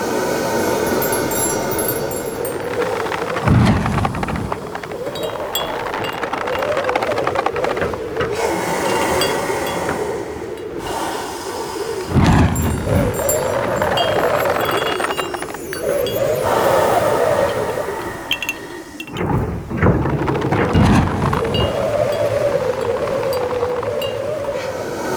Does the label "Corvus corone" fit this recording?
No